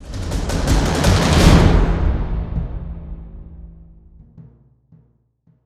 SYNTH PERC; transformers war for cybertron gamerip

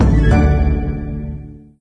Level Completed; candy crush saga gamerip

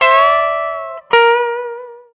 Surf Guitar

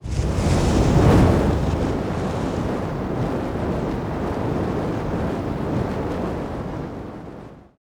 Fart Fire

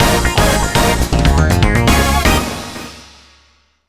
Victory!; Super Dragon Ball Z; PS2

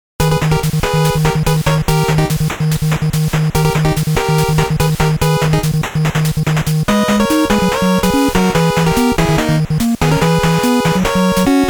Stage; Dr.COSSACK STAGE

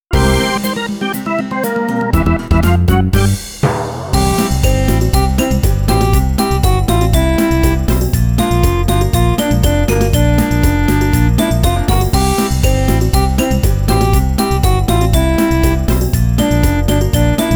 Comical Country; Meteor Soundtracks Vol.1

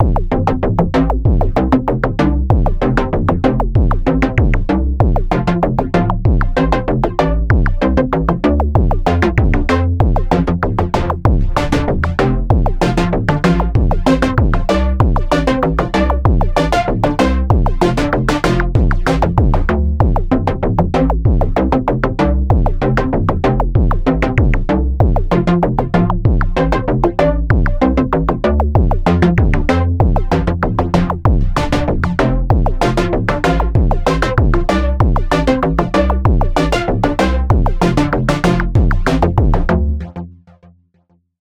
Dungeon Beat; tallowmere gamerip 2014

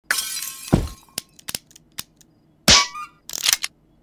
Gun; Stealing The Diamond; WW2 Exhibit

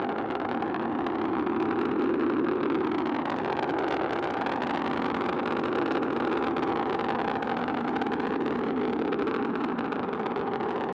Forcer Thrusters; we are the dwarves gamerip 2016

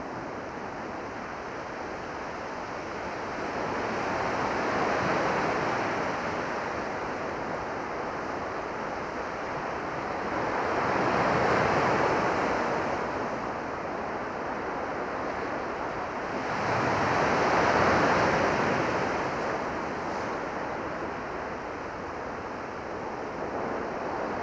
ENV St Loop; fishing resort family fishing 2011; wii

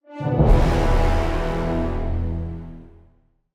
Stingers Objective Negative 148BPM

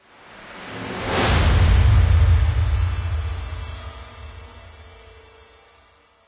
Swell; friday the 13th killer puzzle

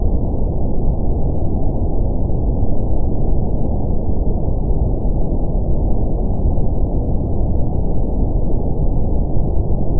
Mus Static; Knuckle Sandwich

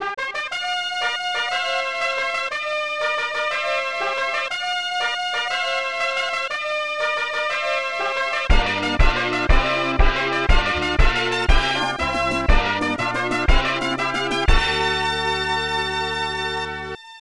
Race Start Fanfare

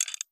screw Manual

